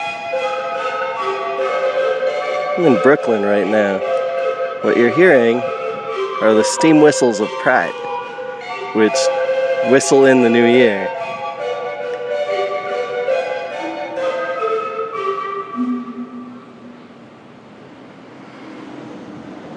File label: steam whistle chopsticks